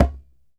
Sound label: DJEMBE, African Perc